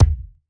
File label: Ball Collision Geo Tackleball Hard